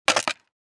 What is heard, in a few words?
Skateboard stunt ollie designed